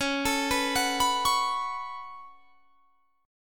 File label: C sharp 7th Suspended 4th Sharp 5th; C#7sus4#5; arpeggiated